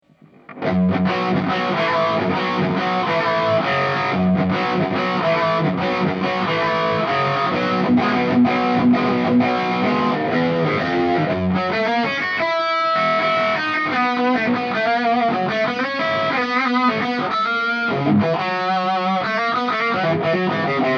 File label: echoplex switch early